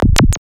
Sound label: SYN FX; X-Static Goldmine 1